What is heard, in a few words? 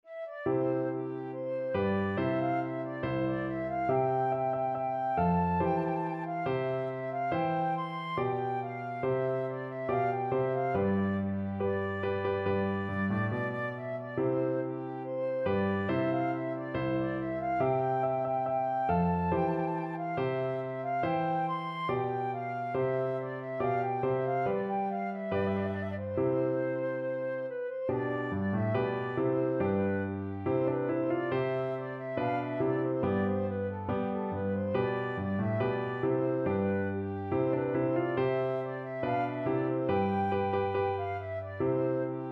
Masterpiece Theater flute